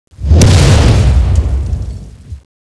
grasp, tentaculo; CSO KrakenBoss

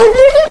zombie dog hurt; meatwall

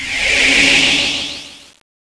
magic knife magic start

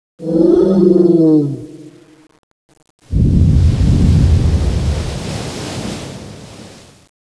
exit, tentaculo; CSO KrakenBoss

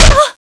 hit flesh

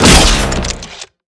chainsaw, weapons

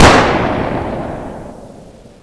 gun shoot; CSO Cronowing; cronobotics54

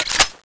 plasma clip out, weapons

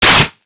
sg552 claw, weapons; buff sg552ex

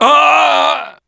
human die; wolfteam

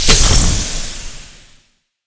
pistol shoot end; weapons

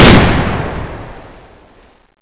buff sg552ex, weapons

